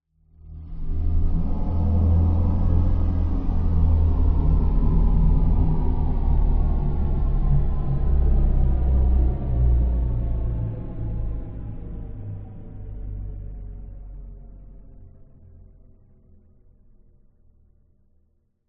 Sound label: horror ghostly pass